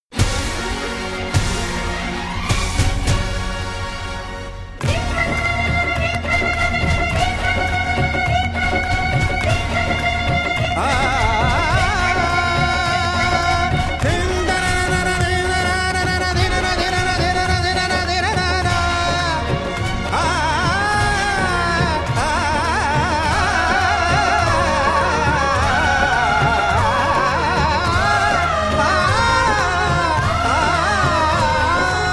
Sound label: Instrumental; Arjun Pandit 1999